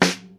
Sparkly Snare Drum F# Key, steel snare drum tuned to the F# note